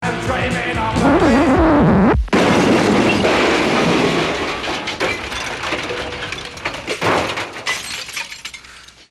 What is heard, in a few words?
Scratch N Crash